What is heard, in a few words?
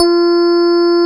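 DX EP A2, DX electric piano A2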